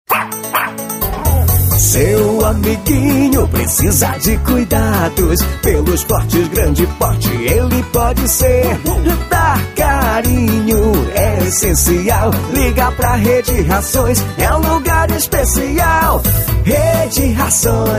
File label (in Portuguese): jingle pet, Jingle comercial